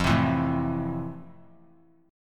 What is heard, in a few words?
F Major 7th F; strummed; JGuitar